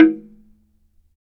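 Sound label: Slit Drums, hard; Roland LCDP11 Africa VOL-1; Wood & Metal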